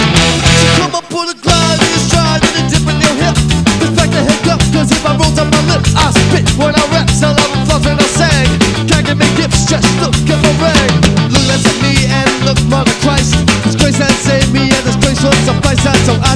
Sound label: tones